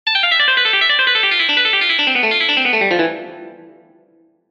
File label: pulling